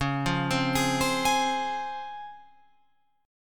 C sharp Major 7th C sharp Guitar Open F; C#M7; arpeggiated

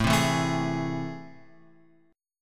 A Minor Major 7th; AmM7 Chord {5 3 2 2 X 4} strummed

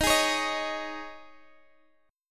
D sharp 7th Suspended 4th D sharp, Guitar Drop D

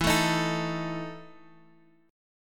E Major 7th Suspended 4th E, Guitar, Standard; strummed

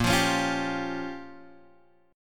Bb Minor 6th, Guitar, Open F; Bbm6 strummed

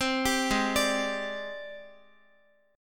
Ab Major Sharp 11th Ab down Guitar; AbM#11 arpeggiated

